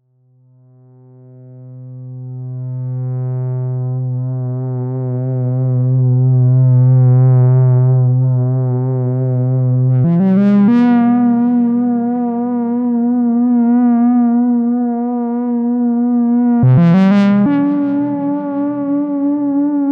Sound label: ppg1002 solo